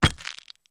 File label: Flash Bulb Pops Poofs